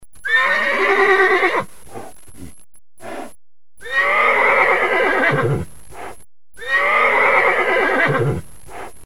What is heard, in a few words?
clydesdale